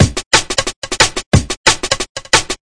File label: DRUM LOOPS and BREAKS, 179 bpm; 161 to 180 bpm